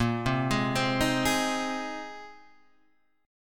A sharp 7th Suspended 2nd Suspended 4th, Guitar Standard chord; arpeggiated; JGuitar